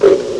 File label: disk